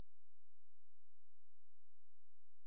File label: silence; Ya Dunno Dick (1997); VCA Interactive